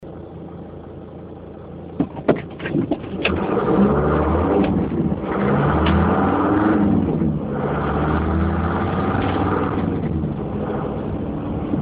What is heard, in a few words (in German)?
312D Anfahren warm